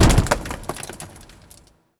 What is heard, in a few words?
medium rock impact